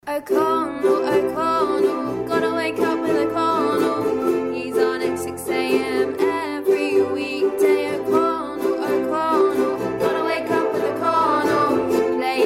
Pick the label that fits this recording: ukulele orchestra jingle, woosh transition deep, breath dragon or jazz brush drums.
ukulele orchestra jingle